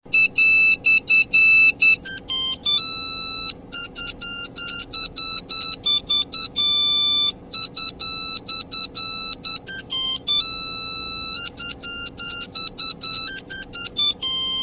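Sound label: jingle bells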